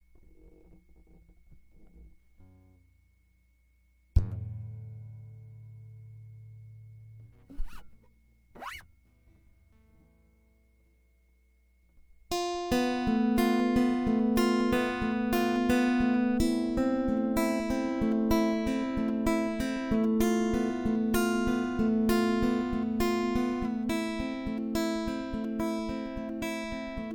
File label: Incites Insights Guitar Loop; hyper terrestrial